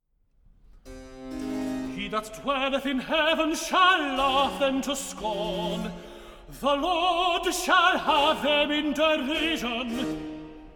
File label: recit he that dwelleth in heaven shall laugh; sacred christmas